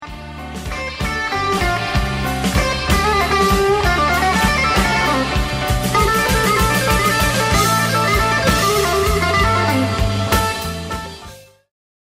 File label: blues in d natural